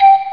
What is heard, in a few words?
GLASS HI, HOUSE